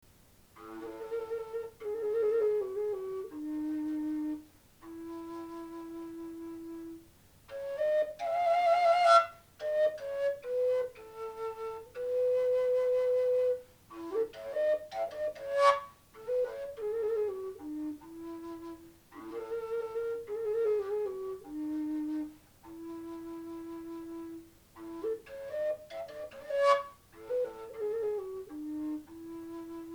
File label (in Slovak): fujara A